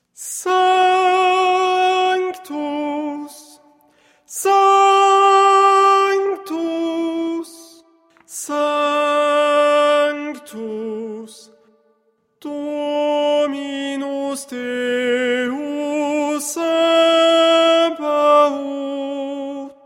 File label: tenor; Sanctus